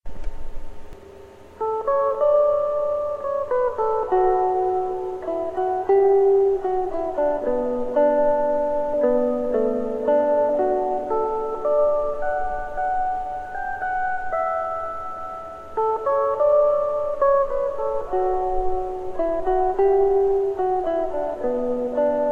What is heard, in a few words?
mando